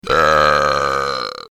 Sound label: brap